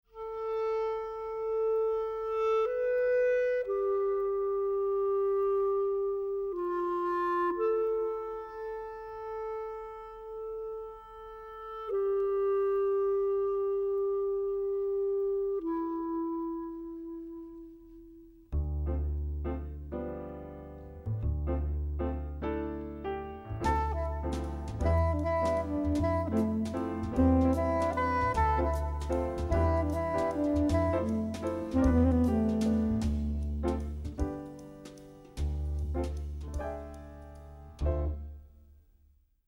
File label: sad notes